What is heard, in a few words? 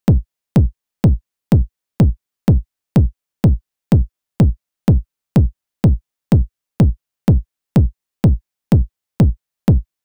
EDM Kick; Domino; Extreme